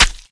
light Flesh; Fate

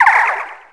idle, gryphon; Fate The Traitor Soul; MONSTERS

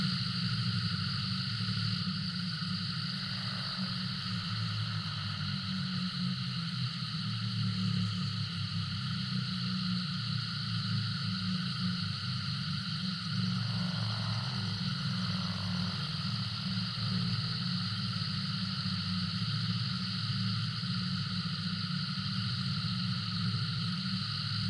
ambiance mudroot; Fate The Cursed King

